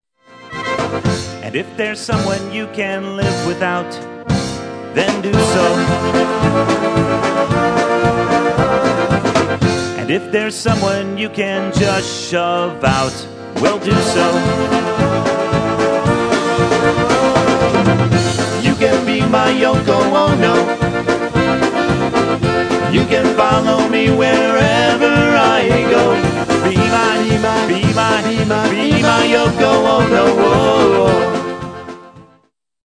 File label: Polka Band; Detroit